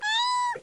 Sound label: Kitten